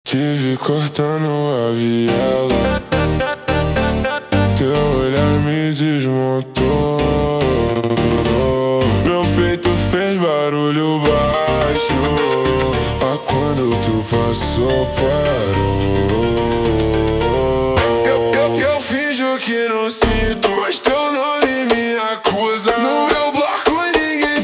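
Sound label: ultra Slowed Reverb, phonk music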